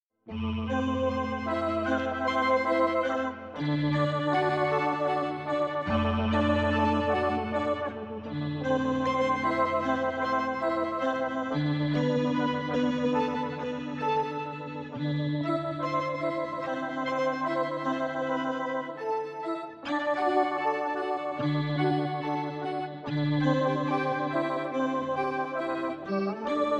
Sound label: X-Mass Bells